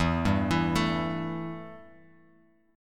E Minor Double Flat 5th E Guitar Open G

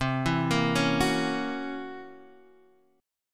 C 7th Flat 5th Flat 9th C, chord

